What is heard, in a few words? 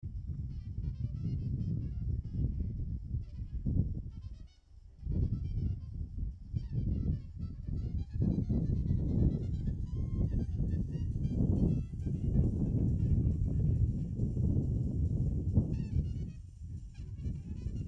WIND WITH JAZZ; HUDSON